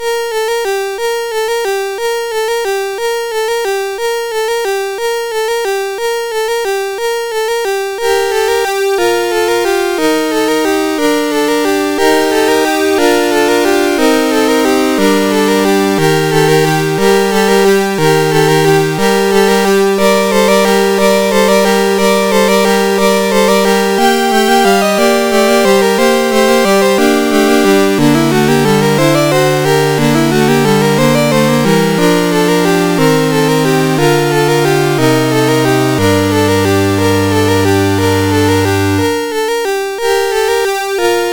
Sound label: Carol of the Bells, MOS6581